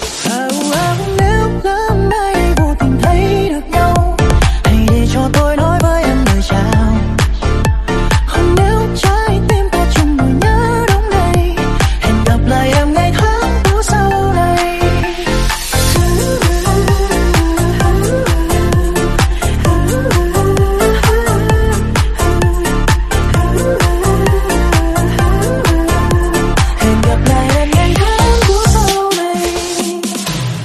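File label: ringtone remix; nhac chuong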